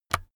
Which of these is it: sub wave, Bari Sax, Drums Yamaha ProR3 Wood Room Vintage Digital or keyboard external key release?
keyboard external key release